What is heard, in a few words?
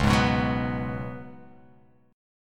C sharp 7th Suspended 4th C sharp